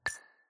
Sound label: button